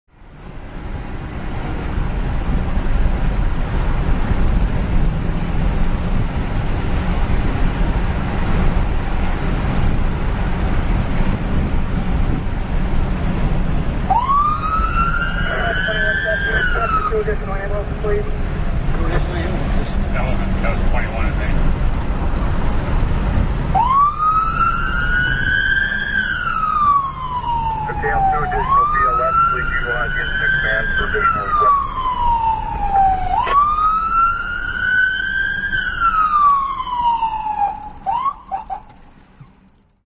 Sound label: squad responding to working house fire riding siren seat; squad 52; goodwill 2nd in